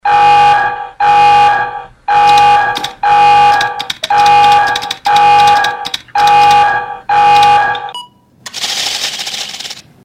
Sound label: Computer - Critical Tones Then Typing; Lost